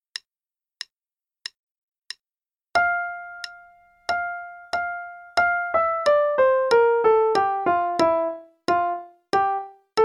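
Play-a-long, Sonatina in F, right hand only, eighth note=92; Beethoven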